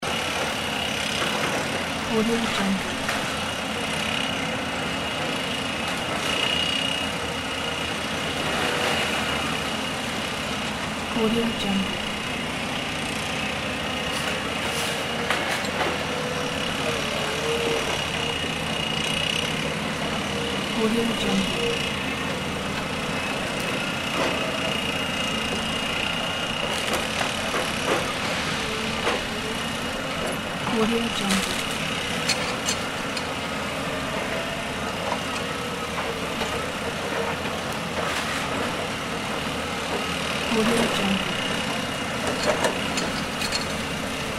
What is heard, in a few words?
intense construction sounds with heavy machinery